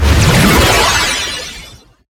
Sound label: power up spewer amplified